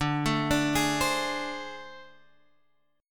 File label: A Minor Major 7th Double Flat 5th